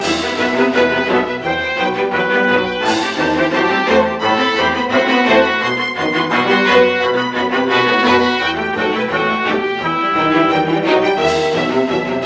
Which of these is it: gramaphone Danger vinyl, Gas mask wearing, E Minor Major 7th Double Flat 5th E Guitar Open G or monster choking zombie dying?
gramaphone Danger vinyl